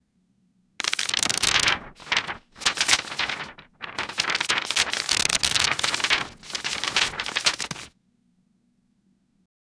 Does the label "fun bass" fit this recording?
No